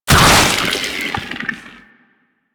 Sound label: Infested Fishing Fish Kill; Warframe; Infested Fishing Spear